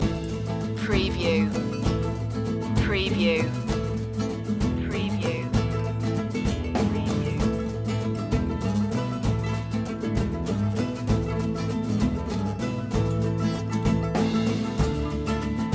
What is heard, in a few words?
andean nights loop